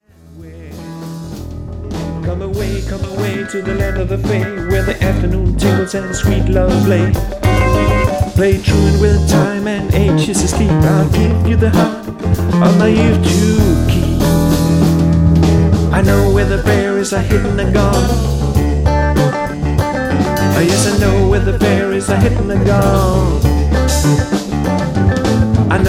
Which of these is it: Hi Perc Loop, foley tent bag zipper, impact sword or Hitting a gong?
Hitting a gong